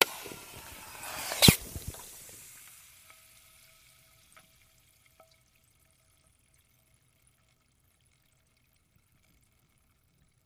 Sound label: Soda Can Opens, eating